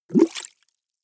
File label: bubbles hard